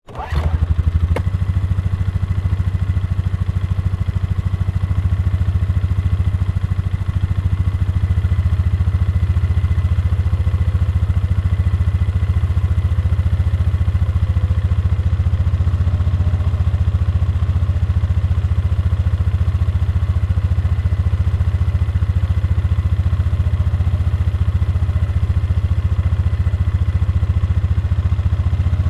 Snowmobile Run, Snowmobile, Start, Idle, Off, Rear, Perspective, Yamaha, Exciter, 440; Sports; Miscellaneous Sport Sound Effects; BlastWave